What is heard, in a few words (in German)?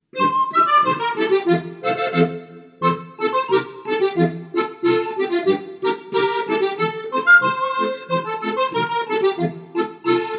Dampfnudel-Polka